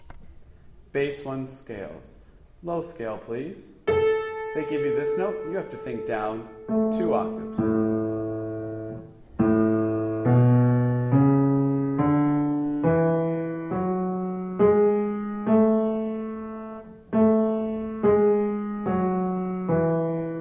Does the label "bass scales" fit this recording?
Yes